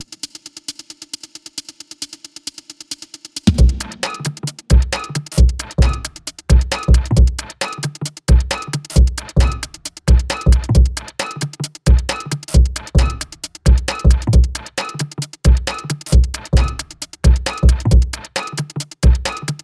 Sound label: Beat